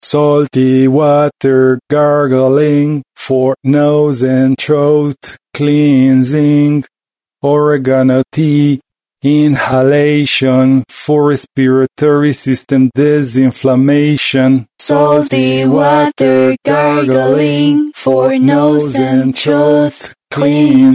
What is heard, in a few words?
Acappella